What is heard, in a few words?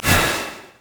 arc throw; Diana